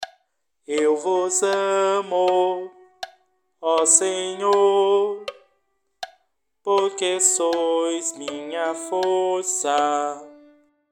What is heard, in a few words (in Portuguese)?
Salmo coro